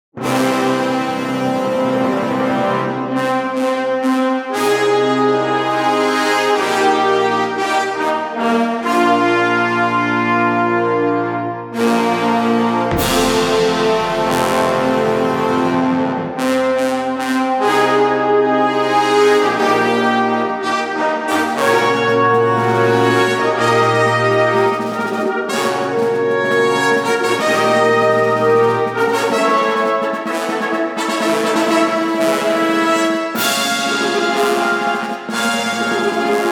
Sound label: Power Brass